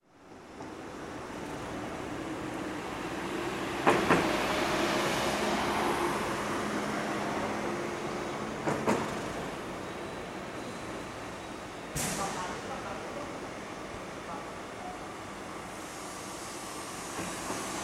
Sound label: Jakarta Airport